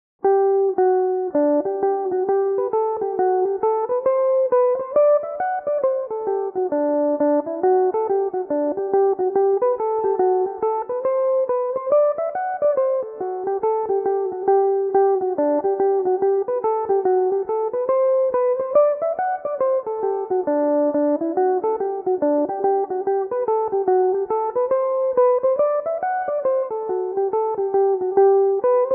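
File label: hornpipe G major, Irish mandolin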